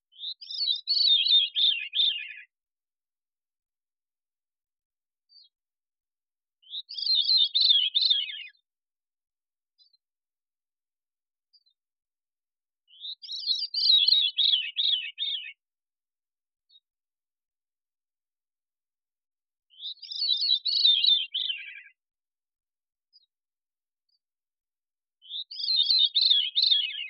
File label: Veery